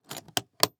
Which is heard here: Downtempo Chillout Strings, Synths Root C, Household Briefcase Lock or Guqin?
Household Briefcase Lock